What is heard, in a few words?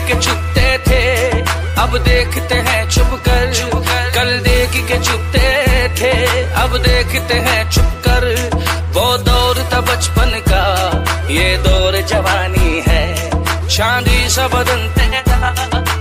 english remix trending music ringtone